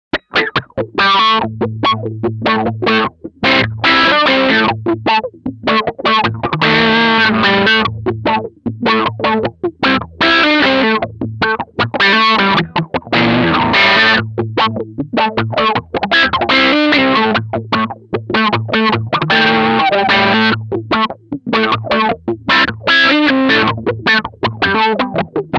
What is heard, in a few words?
Neck Pickup LP